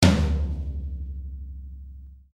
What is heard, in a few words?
instruments drum tom; maoudamashii